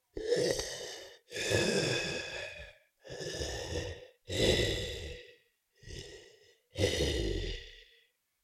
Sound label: Cartoon lion voice breathing